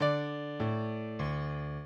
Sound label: minuet, mozart